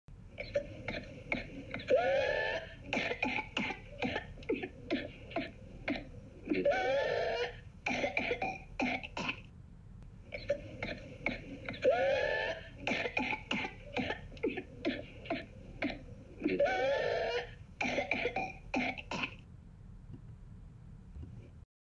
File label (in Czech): Zvuk černého kašle